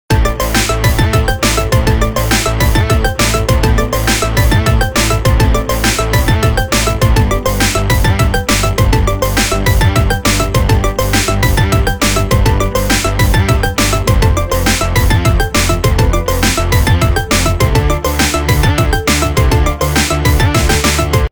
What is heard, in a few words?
Computerized, ringtones; Sony Ericsson V640i